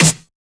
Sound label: Snare; FLPRO; Snares